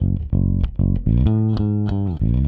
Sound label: Rock, Bass; Bass X